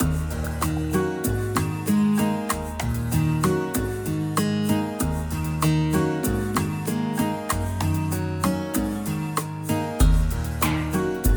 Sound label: Indian rain Loop